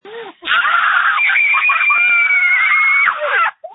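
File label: Scream, phone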